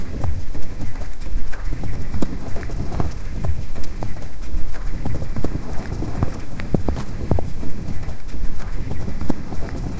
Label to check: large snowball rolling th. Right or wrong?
Right